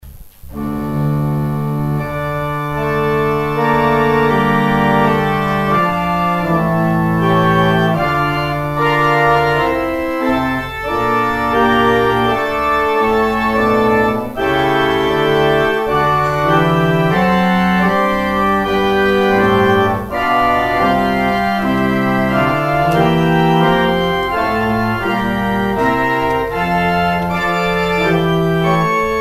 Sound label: organ outro; exit music